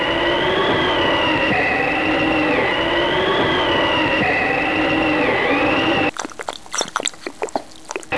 WIND

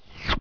SHRT THRW; toon

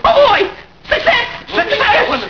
WIN

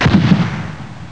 explode

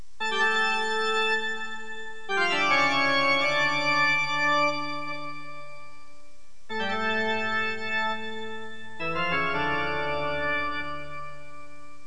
ORGAN

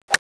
golf

